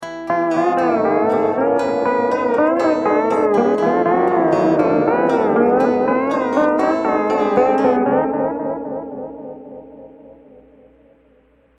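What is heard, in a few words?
acoustic guitar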